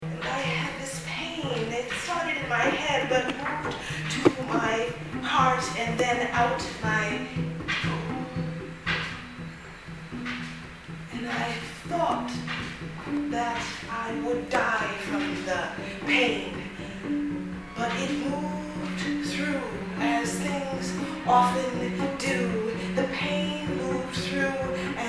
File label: duet